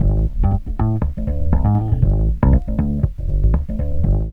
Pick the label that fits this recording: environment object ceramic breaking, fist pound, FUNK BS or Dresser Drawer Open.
FUNK BS